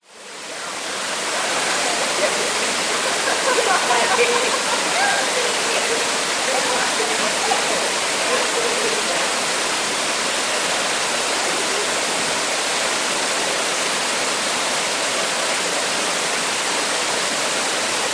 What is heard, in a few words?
waterfall into lagoon people swim chat laugh rainforest; nature